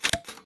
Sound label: nuke cover open; World Of Goo